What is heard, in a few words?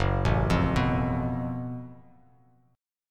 F sharp Minor Major 7th Double Flat 5th, Bass Standard; arpeggiated